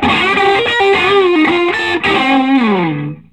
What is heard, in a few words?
SOLO, Vintage Blues Guitar